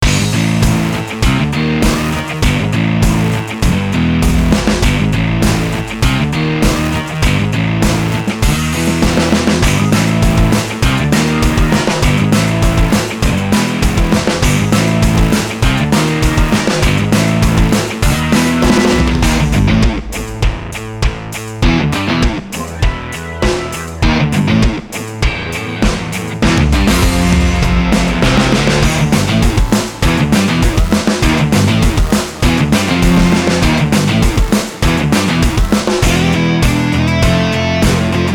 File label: BGM Event Battle; Kenka Banchou 6 Soul Blood; 3DS